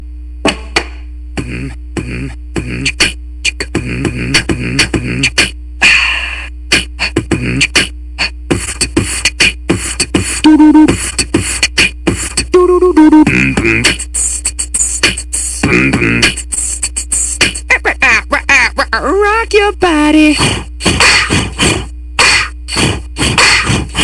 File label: Beat Box